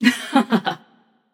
yay passion; chaac

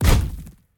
atlas footsteps; smite; god atlas

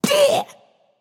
grunt attack, voicepack; smite; jorm

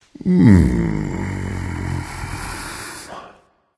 content, voice; Smite; ymir skin9

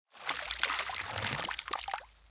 defeat king waterpour; smite; kuzenbo

